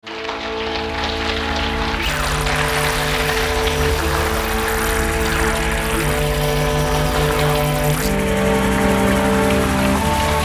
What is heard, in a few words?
Soundscape; ADVENTURES IN SPACE AND TIME